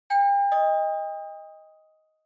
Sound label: Doorbell Fake Far